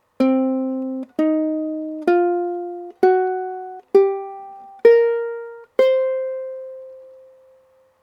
c blues, ukulele

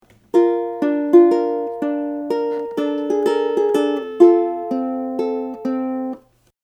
high G, ukulele

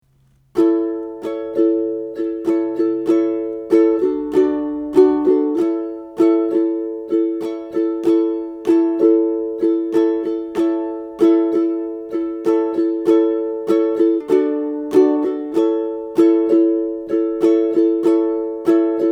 Verse Strum, ukulele

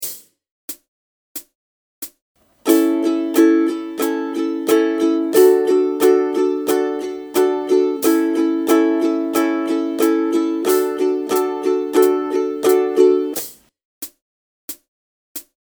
Strum, ukulele

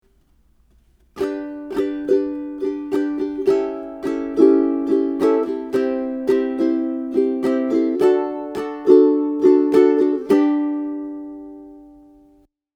Strum, ukulele

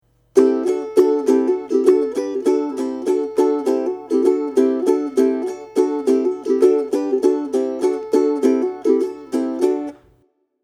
Step On Strum, ukulele

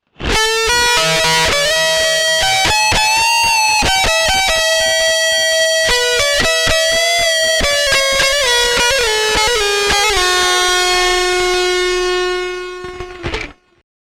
Fretboard Wankery, ukulele

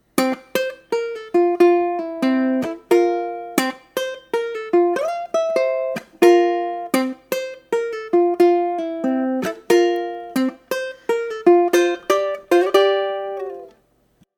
ukulele

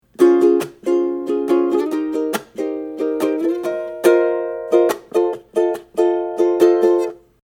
happy Strum, ukulele

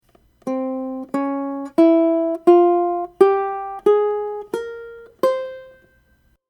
Flamenco Scale, ukulele

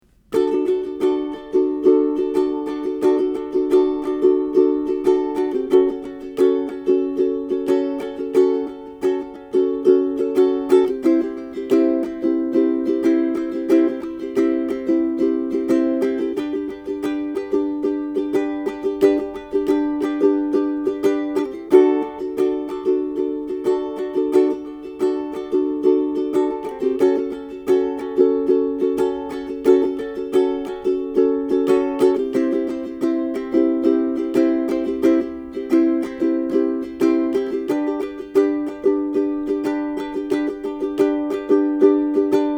Strum, ukulele

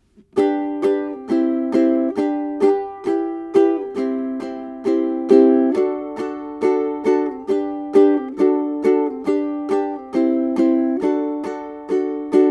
Easy Strum, ukulele

